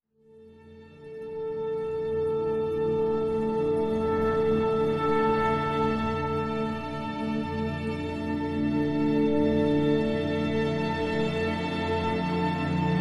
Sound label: orchestra pit; Performing Arts Center